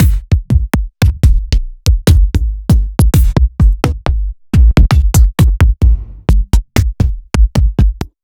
Sub Perc

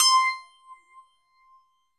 JV phase, JV-1080; Syntec; Wall of Sounds

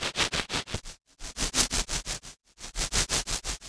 Rythmic Noise 130BPM; layering

